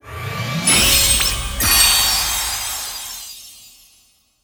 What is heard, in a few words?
League Rankup